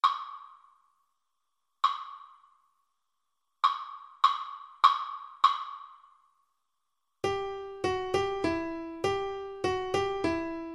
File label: carol of the bells, Guitar